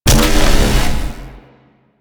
Void Dragon Body Ring Impact; Enemies; Abilities; Warframe; Duviri

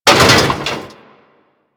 Arachnoid Camper Step Metal Debris

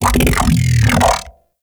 Mech Ambient Vocal; Nechro Mech; Vehicles

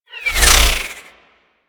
Cluster Shot Whizzby; Enemies; Abilities; Teralyst

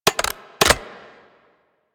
Arch Gun Alt Charge Mech, Weapons; Warframe; Archwing; Hildryn

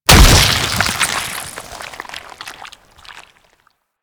Golem Grenade Burst; Warframe; Attacks

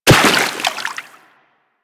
Run Water, Footsteps; Warframe; Operator

